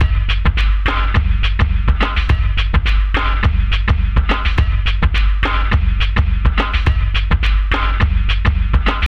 105, Breaks; loops; 105 BPM; Loopmasters; Jon Carter & Alex Blanco; Block Party Essentials

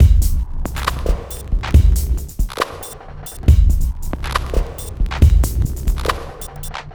Bpm138 Break, dubstep drum loops

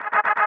C STAB; funky; Loopmasters; Funky Underground Vol 2

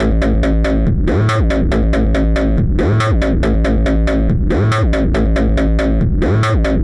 140 A BASSLINE, Dubstep; Loopmasters; The New Sound Of Dubstep; BASS LOOPS

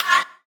F# VOX STAB; funky; Loopmasters; Funky Underground Vol 2

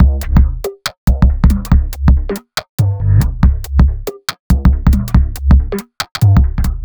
Wall Break, Bpm 140, Dubstep, Drum Loops

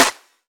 Claps & Snares; Mutekki Media; Vengeance Electroshock Vol.2

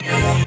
F STAB, funky

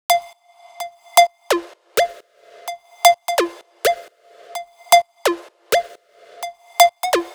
Electro House Melody; Mutekki Media; Vengeance Electroshock Vol.2

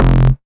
Bass Shot F

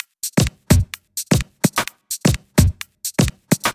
Dirty Dutch Loop

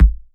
Kick

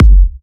Bassdrum

